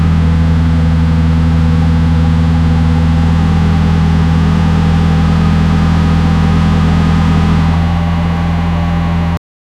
engine sound in sport car

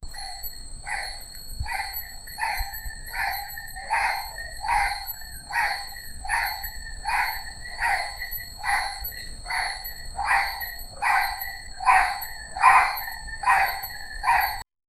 sheep is waking from bed; domestic

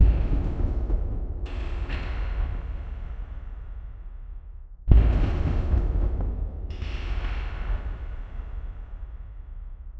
layered furnace march low, mid-frequency

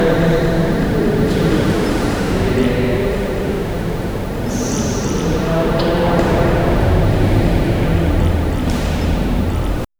church interior cathedral

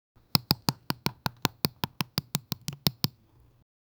tomato cutting slowly, cooking

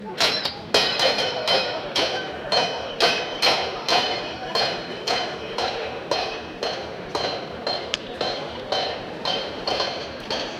large crowd working with, background noise

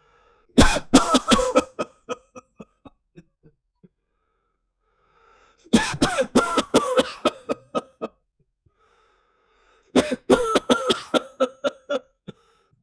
a man coughing while do, athletic

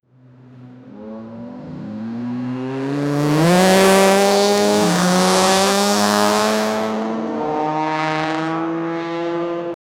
super car racing

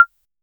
clean and satisfying sound

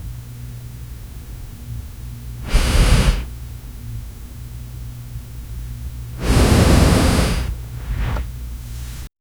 steady tense silence with

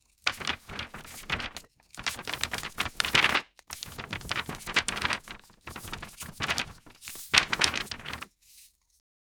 dry paper shuffling stack

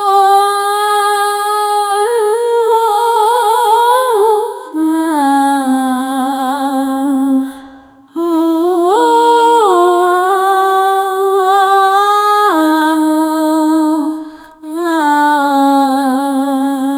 woman singing aaaaah eeee, female voice

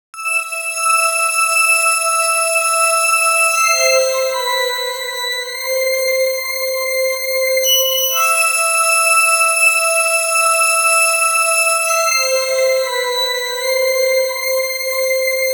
cinematic halloween score

